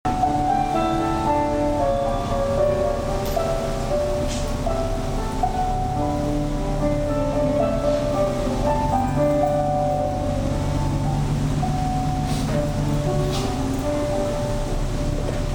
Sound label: piano on boat soundscape